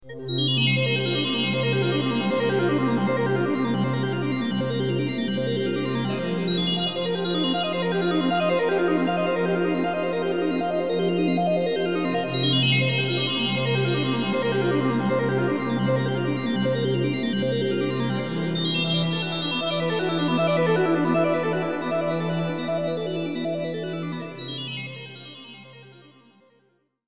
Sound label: swirl